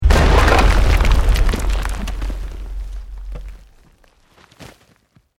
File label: EXPLOSION MEDIUM STONE ROCK; Created explosion effects, house, building or vehicle effects; Bombs